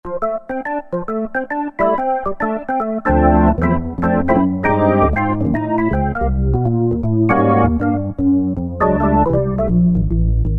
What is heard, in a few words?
Jazzman, Yamaha TG77; Factory Presets